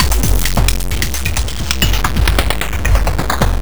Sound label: Industrial Loops; 132 BRITE; BRITE FX